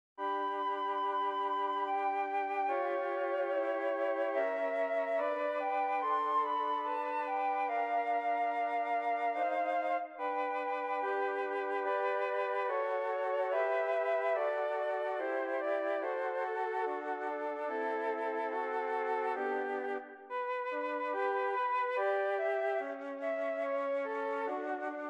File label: 3 Flutes